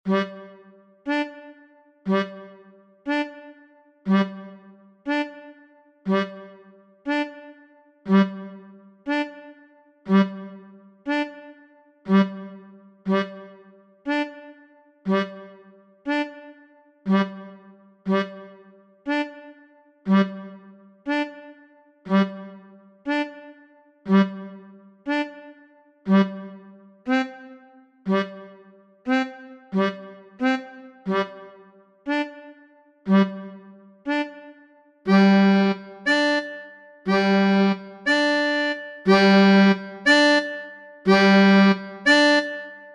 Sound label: melodica; Gayane act tune